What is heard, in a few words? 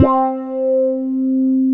WOW GT1-C3, WOW GUITAR; AKAI S-Series CD-ROM Sound Library VOL-7